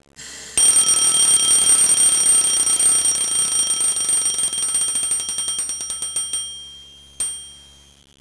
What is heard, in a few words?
alarm, fire; disaster